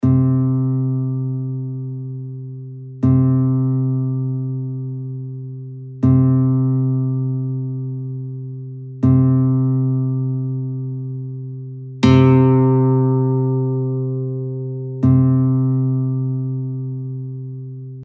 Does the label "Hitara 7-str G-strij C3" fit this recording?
Yes